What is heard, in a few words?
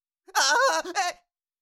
Cartoon little monster voice sigh surprise